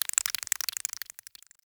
Ext Critter Scatter Clicky; Ambience; Misc Outdoor; Warframe; Infested Eris

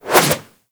Backflip Whoosh; Characters

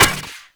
Updated Shot; Warframe; Moa Pet; Multron

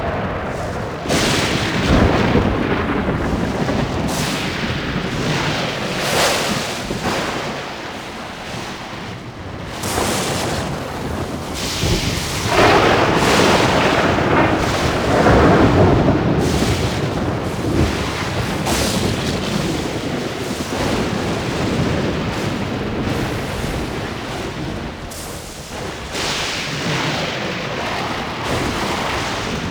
Lightning Storm Loop; Warframe; Ambience; Entrati

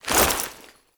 Bayonet Melee Whoosh Gear Accent; Warframe; Tenno

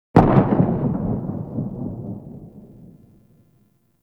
Arch Grn Skiff Drone Dist Explo, Explosions; Warframe; Archwing

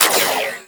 Miter Shot, Weapons; Warframe; Tenno

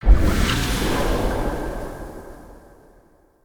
UI Pollution Tower Start Stinger; Hud UI

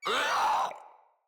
Infested Crawler Pain; Crawlers

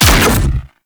Electric Tether Shot; Warframe; Railjack; RailJack Weapons

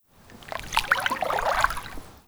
Water Lapping Gentle Small; Ambience; Warframe

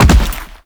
Land Heavy Default; Enemies; Footsteps; Warframe; Teshin

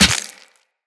Run Bone, Footsteps; Characters; Operator

